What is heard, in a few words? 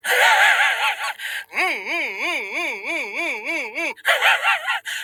hairy laugh